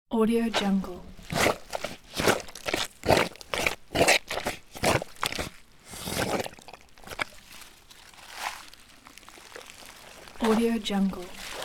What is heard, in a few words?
feet stuck in deep mud; راه رفتن به سختی در گل; human sounds